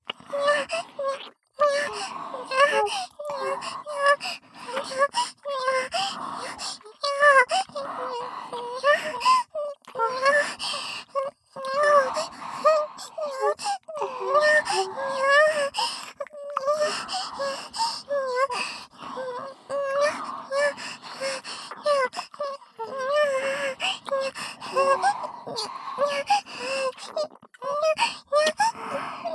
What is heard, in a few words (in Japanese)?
キス＋ロリ喘ぎループ